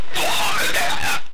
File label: Die